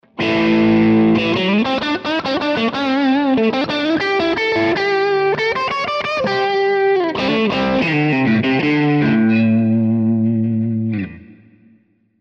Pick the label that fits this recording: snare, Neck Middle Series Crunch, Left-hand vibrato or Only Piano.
Neck Middle Series Crunch